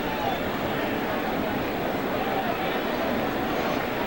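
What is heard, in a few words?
baseball crowd ambience loop; SFX Kit; Crowds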